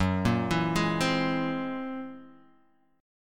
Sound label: Gb 9th Flat 5th Gb down Guitar DADGAD, chord